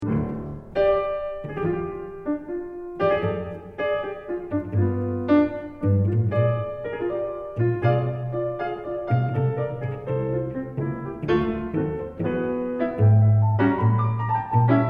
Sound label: Ringtone Groovy